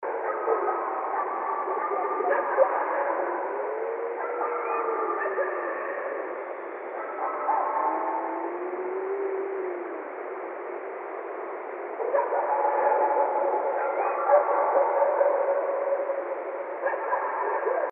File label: Moan Howl